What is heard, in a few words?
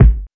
warm steel kick drum A key; Duration 0:01; Channels Mono; kick drum samples